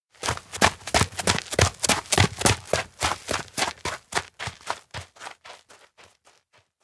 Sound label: steps outdoor ground run; fumiya; anemoi